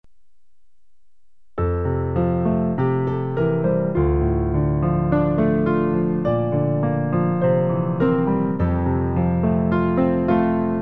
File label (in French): piano